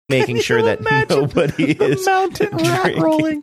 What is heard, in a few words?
laughing saying can you imagine